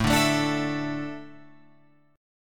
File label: A Suspended 4th down Guitar Open F; Asus4 strummed; JGuitar